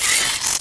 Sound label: houndeye hunt; gearbox